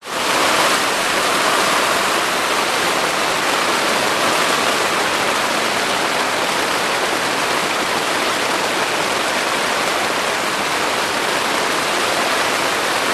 nature rain on tent internal recording; zapsplat

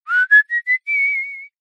human male whistle short tune riff

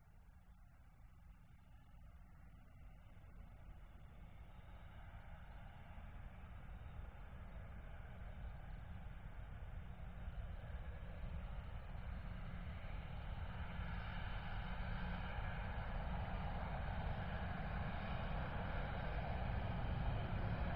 vehicles aircraft private jet take off distance

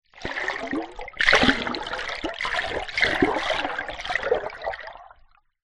water underwater movement; nature; zapsplat

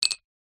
foley bottle top metal down on wood surface

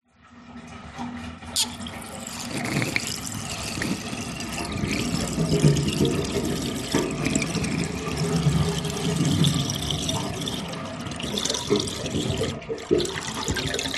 household bathtub drain close up to plug hole

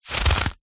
foley footstep single boot wet slushy snow

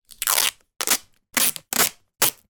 office sticky tape rip from roll rhythmic

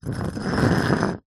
brick scrape on smooth concrete; foley

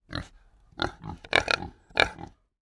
pigs kunekune x2 grunt snort

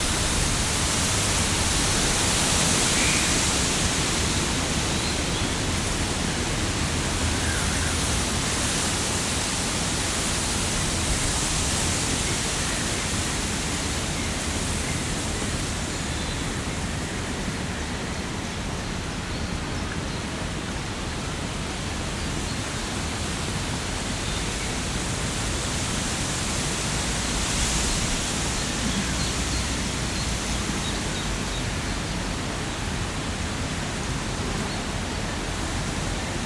nature reeds wind lake distant birds

